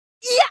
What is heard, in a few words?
attk